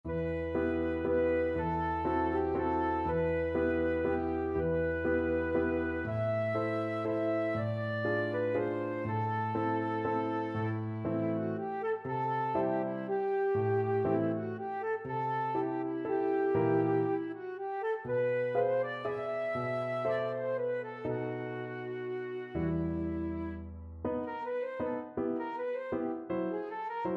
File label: waltz flute